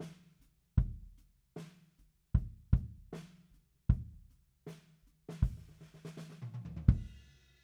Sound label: BD OUT